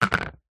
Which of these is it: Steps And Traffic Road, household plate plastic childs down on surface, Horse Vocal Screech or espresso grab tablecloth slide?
household plate plastic childs down on surface